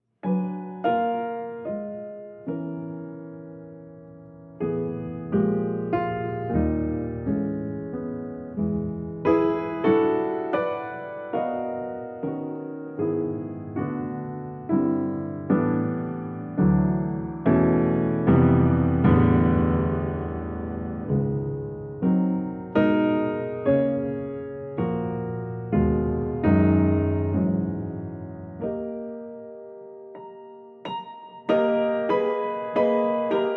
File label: Improvisation